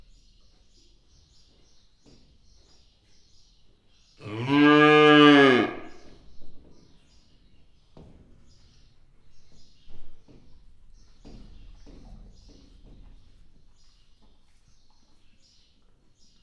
kettőt bőg, palánk tetejéből, őstulok; Hortobágyi Nemzeti Park

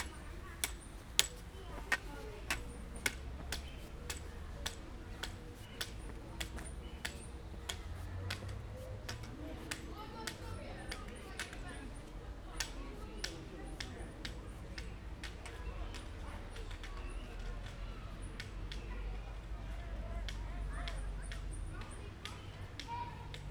balról jobbra hosszasan, fehérbot; Győr zoo

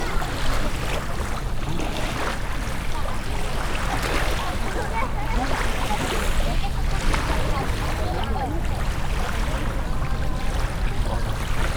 kisgyerekek az egykori börtönsziget strandján

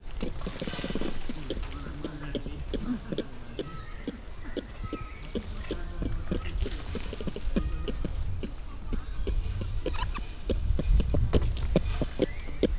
ritka hang, gyűrűsfarkú maki; allathangok; veszpremizoo 2013

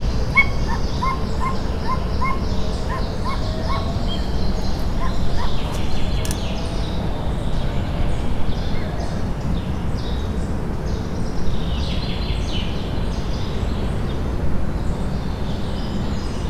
fotó is, szirti sas; szegedi zoo 2011

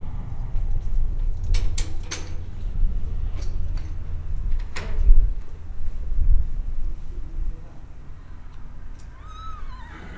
istallo ajtajabol, lo; állathangok; paraszt udvar

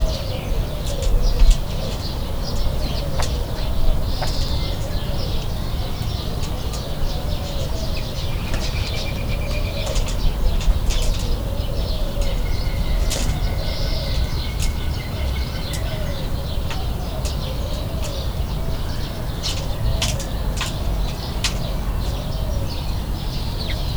fémtálból csipegető verebek; debreceni zoo 2012; hazi vereb; marantz puska

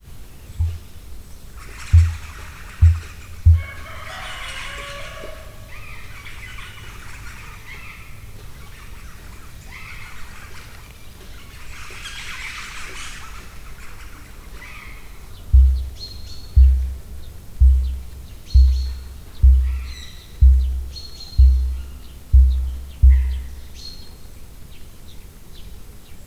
kakas, kutya, dolmányos varjú; miskolci zoo 2009

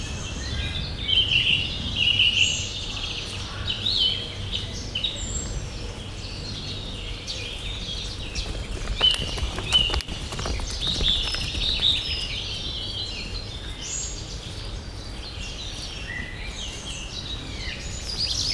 balról jobbra halkan elfutnak, hangos madarak, láma; jaszberenyi zoo 2015

